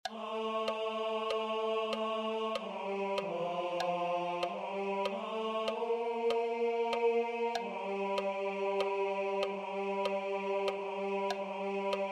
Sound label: tenors; mozart laudate dominum